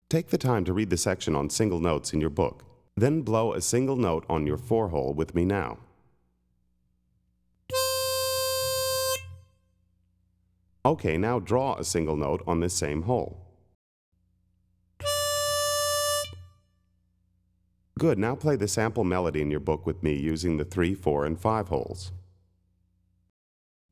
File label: Single Notes, harmonica; Play Harmonica